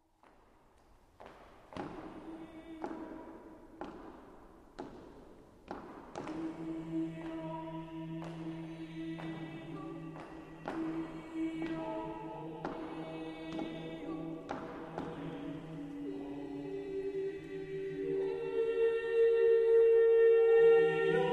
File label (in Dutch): A capella, 16 stemmen; (4 x s,a,t,b)